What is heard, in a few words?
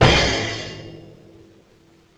Bass Drum Cymbal Hit; Vintage Cartoon; Drums & Percussion